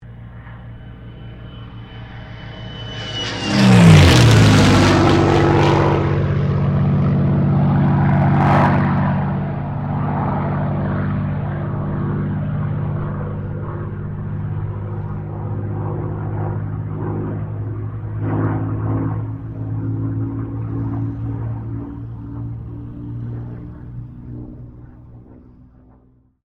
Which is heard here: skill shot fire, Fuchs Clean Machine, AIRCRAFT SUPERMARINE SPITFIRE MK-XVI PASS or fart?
AIRCRAFT SUPERMARINE SPITFIRE MK-XVI PASS